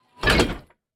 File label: copper chest weathered close